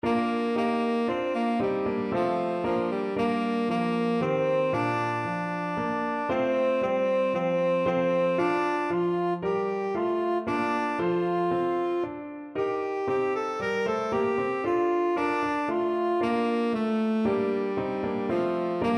tenor saxophone

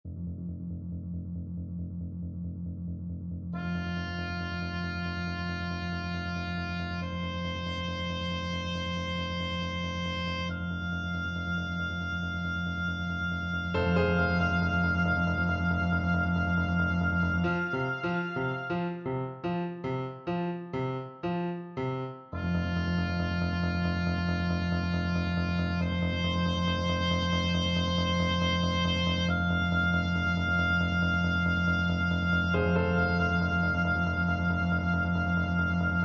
also sprach zarathustra, oboe

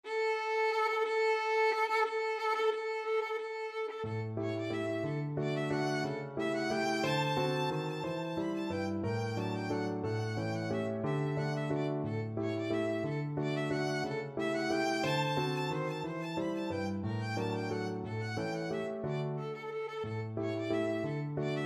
violin

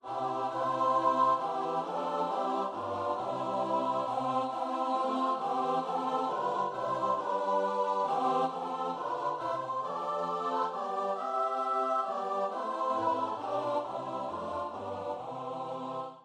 god from on high choir

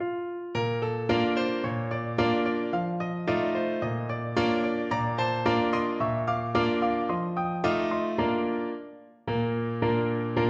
spanish cavalier, tuba

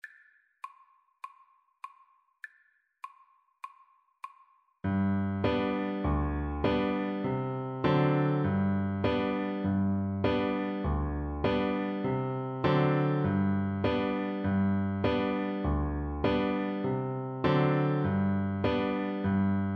alouette viola

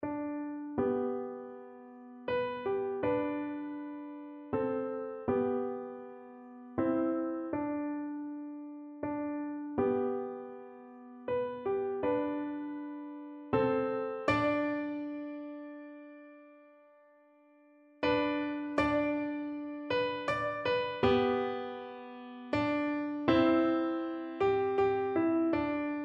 amazing grace PNO, piano

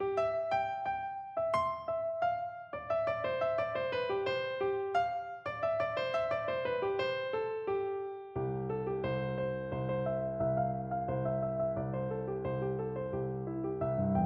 PNO

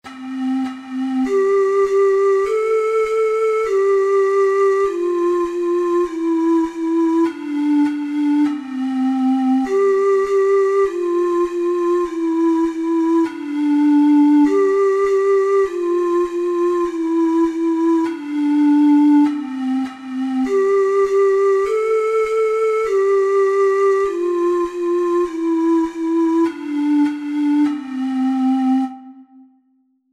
ocarina four hole

